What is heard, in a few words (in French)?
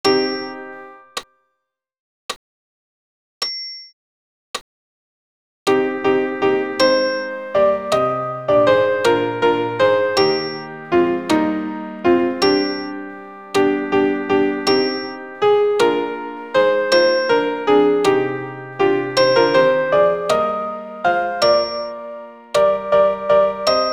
Credo sop pian acc